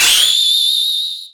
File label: flash of light